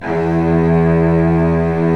Vcs2 f vb; Roland LCDP13 String Sections; STR Vcs2 f vbx5

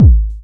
909 BD Tech; Goldbaby Tape808 & Tape909; The Tape 909

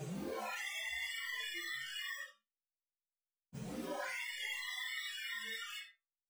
earth screech

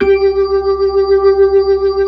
B-3, Blues

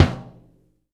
BD, JAZZDRUM; Ueberschall; Drum & Bass Flash Trackz

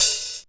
WS HH OPN, DRUM FAMILY2; Back In Time Records; Korg Universe VOL-1

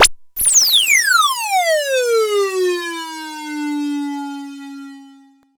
alarm, FX & ATMO, ALARMS & ALERTS; Techno Trance Essentials

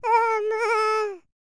PERSIAN, World Voices; ILIO; Vocal Planet VOL-5

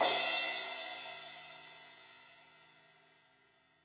Crash 16in edge, Cymbals; Stereo; Deeply Sampled Drums

